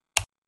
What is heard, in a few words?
typing one key; capcut